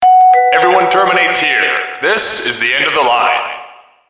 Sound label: subway